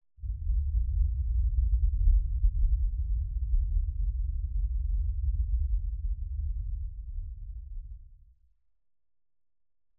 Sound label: Explosion, 5.1 Surround; Sony Pictures Sound Effects Series; SUB